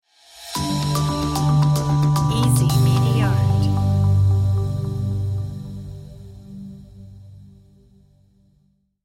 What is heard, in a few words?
bells logo